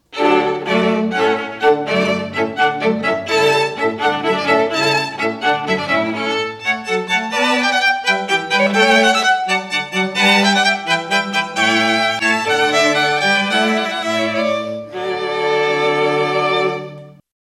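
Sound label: hornpipe, guitar violin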